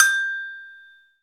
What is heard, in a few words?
AGOGO; Club-50 - Foundations Roland; CowBel+Metl; Agogos